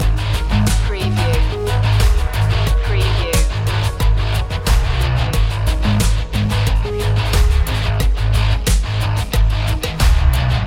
agony loop

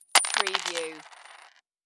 Coin Set Down Plastic

